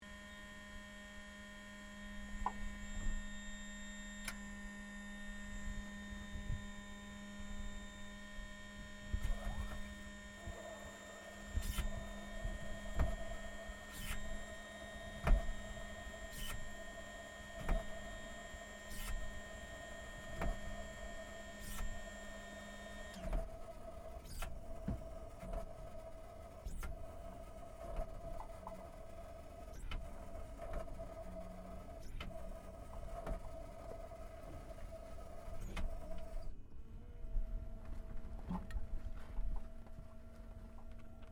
AIRCRAFT CAC CA-18 MUSTANG MK23 STARTUP IDLE INTERIOR; CA-18 /P-51 Mustang; Airplanes (Sound Effects:Vehicles)